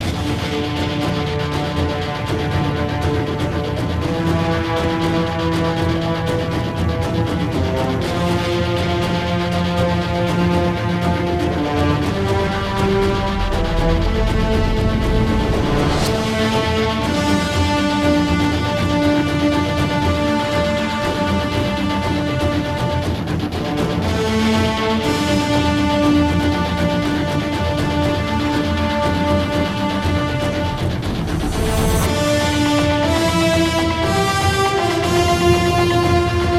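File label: Music Ammo Storage 6channel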